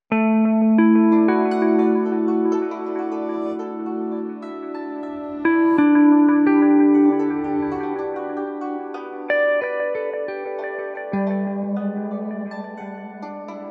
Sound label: GUITAR